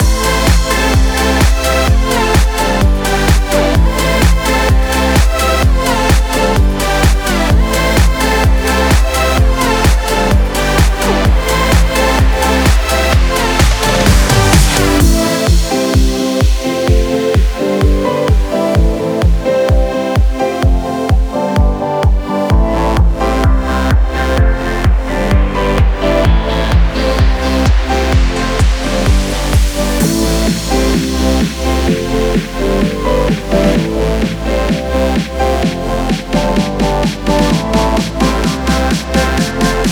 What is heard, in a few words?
house electronic techno; By Burak Sound Bank